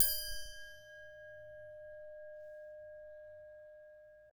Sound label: Bell Brushes; omsox